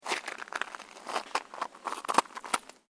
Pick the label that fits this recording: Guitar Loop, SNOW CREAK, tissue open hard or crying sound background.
SNOW CREAK